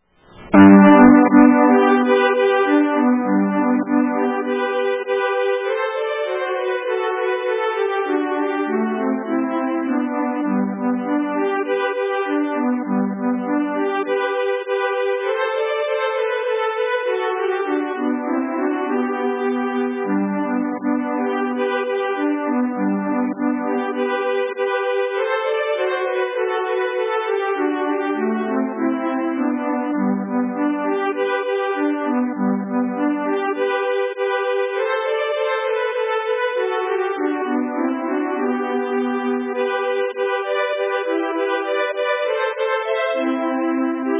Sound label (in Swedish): Schottis; A Drakenberg